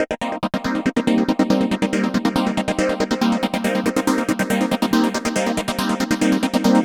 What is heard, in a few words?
Chord Seq; Dr. Fink's Funk Factory